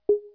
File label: move focus; Panasonic 2015; TV